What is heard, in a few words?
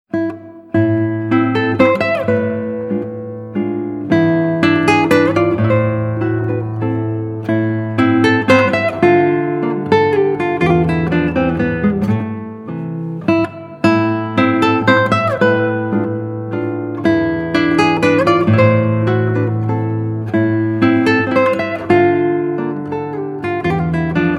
mazurk